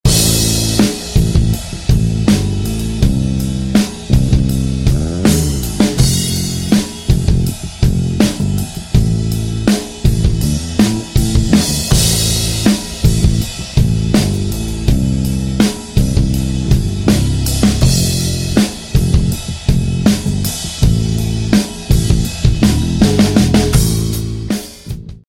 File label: bass; golfstream dr-bass